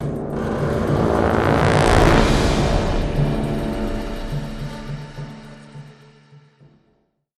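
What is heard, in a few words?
sting, brass drums; transformers war for cybertron gamerip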